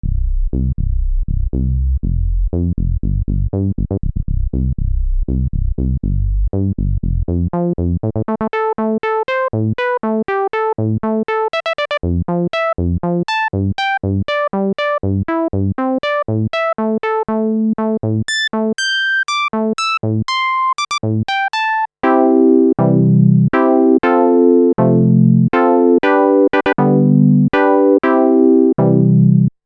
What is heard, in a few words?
roland v-synth xt filter demo tb-filter